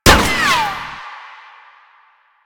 Shield Impact; Warframe; Lasrian; Lasrian Tank

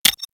Console Hack Button Press; Warframe

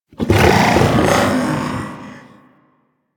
Attack Growl E; Enemies; Vocals; Warframe; The New War; Archon; Amar

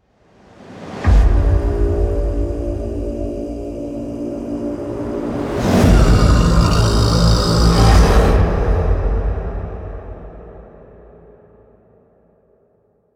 Quest Intro Chant End; Entrati Labs

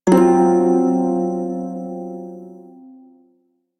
Prime Shawzin Hirajoshi Chord G; Music; Warframe

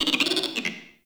Forest Animal Mid; Ambience

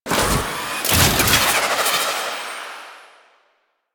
Ship Cinematic Approach; Warframe; Rail Jack; Corpus Ship

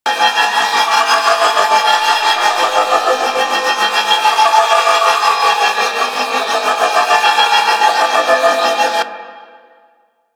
Railjack Coffin Hologram Loop; Warframe; Wraith

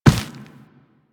Run Dirt, Footsteps; Warframe; Operator

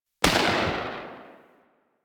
Dex Sybaris Tail C; Warframe; Weapons; Tenno

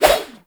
Small Swing Spin, Weapons; Dual Zoren Prime

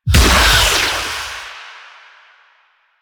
Health Leech Activate; Warframe; Eximus

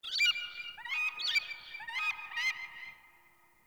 Bird Mountain, ambience; One Offs; Glades Of Andurin

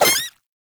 Shrink Baby Jump; Warframe; Nokko

